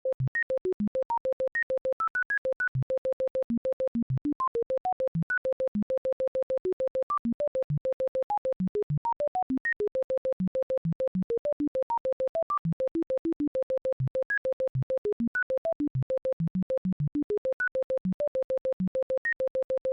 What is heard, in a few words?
beep boop